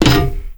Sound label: land metal, FootStep; WarRock